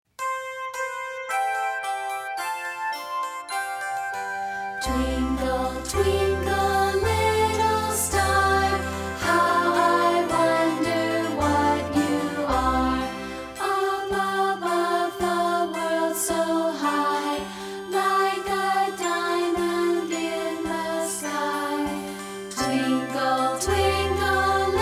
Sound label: twinkle twinkle little star, rhythm ensemble